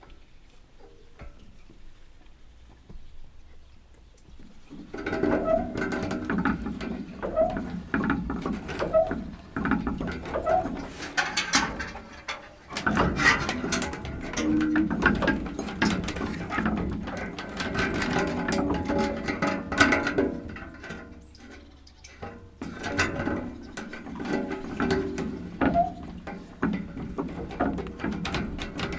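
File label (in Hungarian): vödör leesés fel, kerekes kút; varboc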